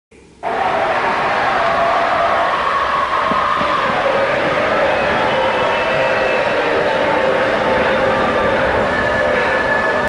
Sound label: scream; fnaf 2; Meme